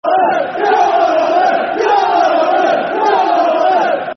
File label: Come On Oldham [Football Chant (Soccer Songs)]; Oldham Athletic Oafc